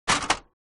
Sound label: Epson inkjet printer paper tray pull out